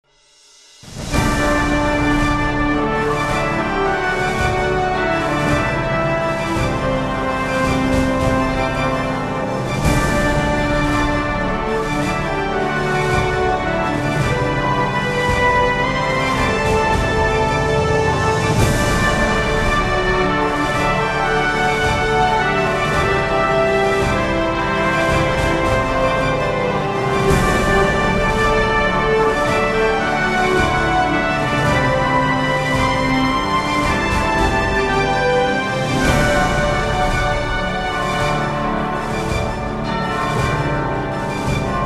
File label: Round Win; Rakion